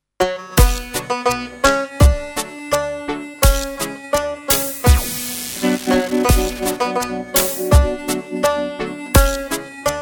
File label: spider vocal; itsi bitsi spider; spinnen